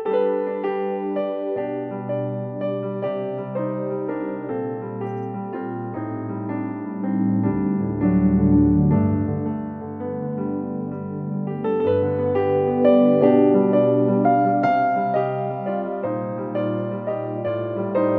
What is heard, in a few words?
Piano Low High Peak EQ; Japan